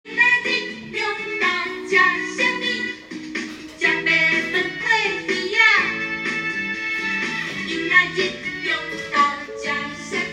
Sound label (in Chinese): 閩南語歌曲